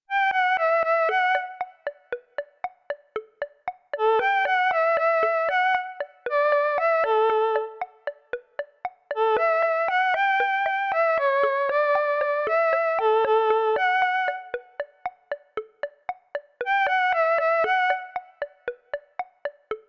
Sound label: formant lead